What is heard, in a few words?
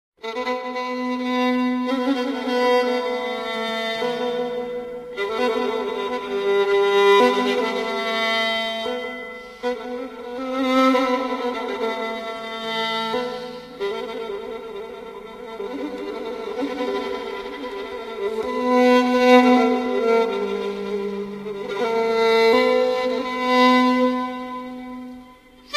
Live Violin